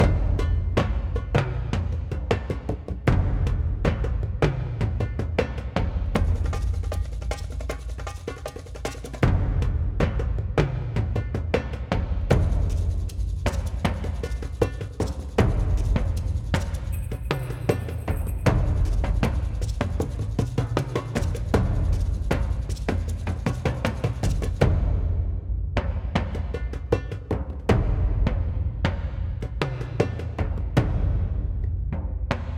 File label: Pub Brawl Upstairs Perc loop alt start